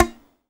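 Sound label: TZRS PIZ E 2, tzouras pizzicato; Soundscan vol.53; Mediteranean Traditions; AKAI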